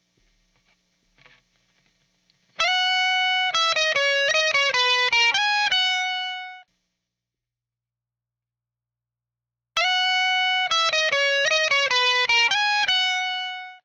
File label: amp gate out in; audio examples from the book